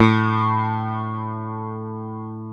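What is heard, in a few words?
electric sitar A1, ethnic; AKAI S-Series CD-ROM Sound Library VOL-8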